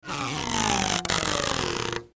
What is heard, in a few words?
Rubber Stretch, Rubber Stretch Balloon; Cartoon; Rubber Stretch Balloon Slow